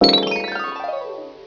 MAGIC

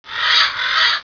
TWO CHIRP

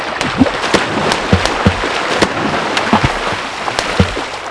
splash

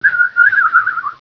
BIRD

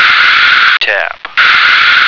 tap

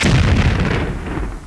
EXPLO

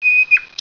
CHIRP

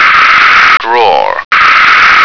drawer

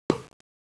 POOF

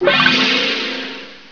SLOT

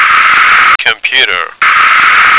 computer

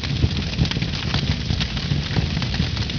FIRE; Softkey Shareware 2000 Hit Games

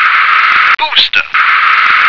booster

